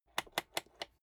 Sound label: rotary control short turn